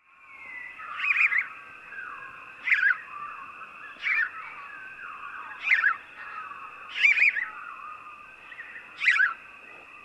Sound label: House Sparrow